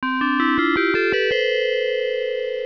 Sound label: chime